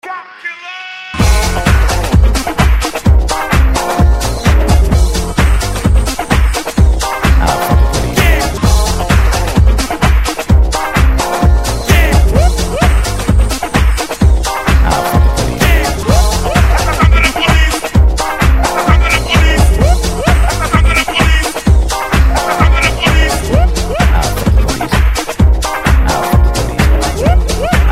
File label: police remix; the platform